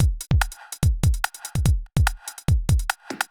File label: fast break 145